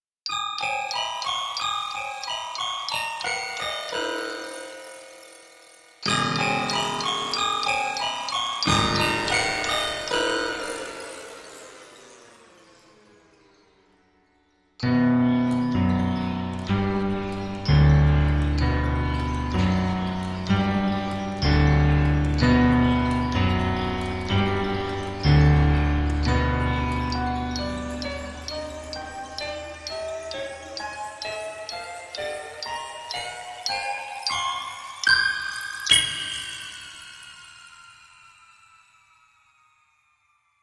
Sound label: The Prepared Piano